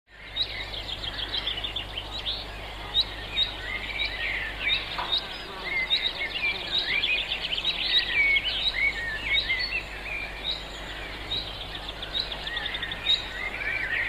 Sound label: Heavy Day Birds